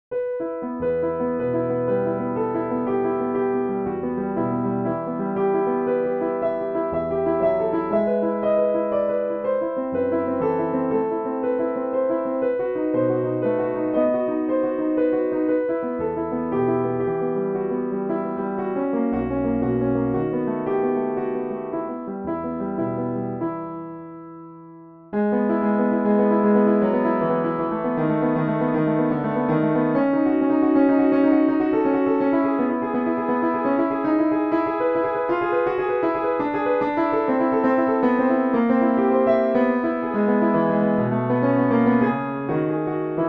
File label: spanish romance